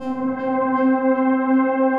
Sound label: Chomperz Split Pad, Synth Pad; Ableton; Factory Packs; Synth Essentials